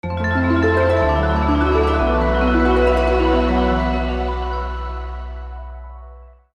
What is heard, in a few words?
Fast Travel Pipe Restored; paper mario the thousand year door